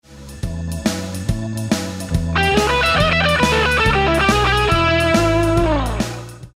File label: Lick, guitar